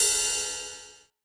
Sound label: DnB Drum Hit Ride; drumnbass 170bpm; Drum Kits; musicradar; Drum Hits A